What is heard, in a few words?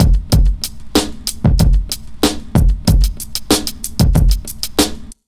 BEAT L, Pure Electro; USB Soundscan vol.03; AKAI